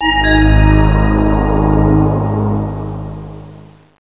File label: new obj; thief